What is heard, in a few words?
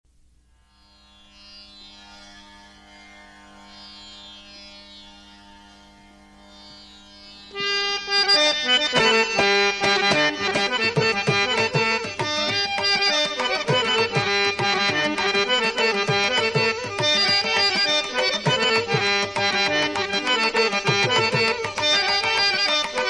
SRI RAGHUKULA (HARMONIUM)